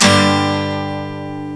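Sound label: Acoustic Guitar Minor Chords, G minor up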